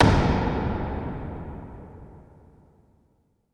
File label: Hit Reverb Dark